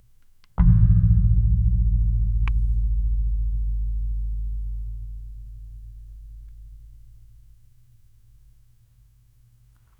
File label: the sound of a thocky, keyboard